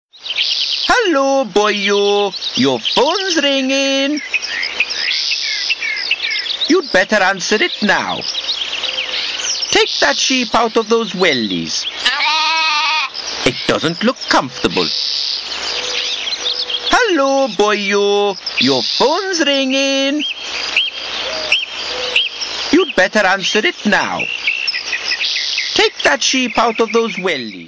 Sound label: Sheep